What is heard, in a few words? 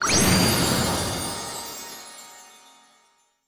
Wave Clear